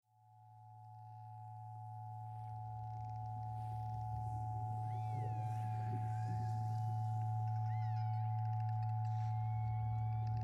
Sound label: 3D Ambisonic